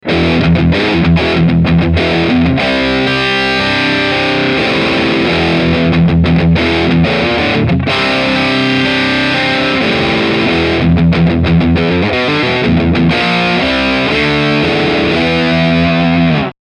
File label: Medium Gain Riff